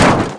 Vehicle Hit Wall; NASCAR Thunder 2004

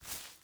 foliage jump; teardown; ps5 windows xbox series xs

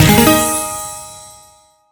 Achievement; battle axe

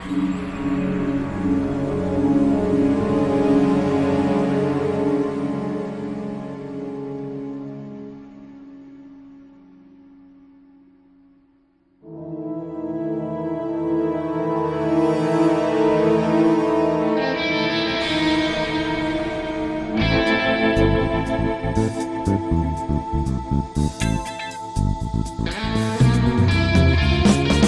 Airstrikes

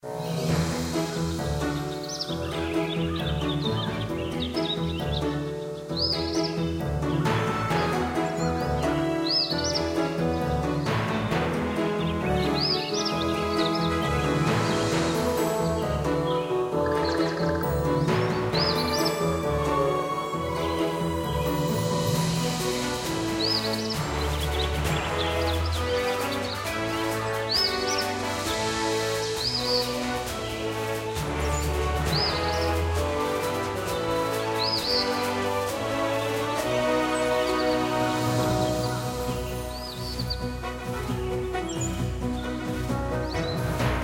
Epic Forest Theme Day; Trouserheart; Android

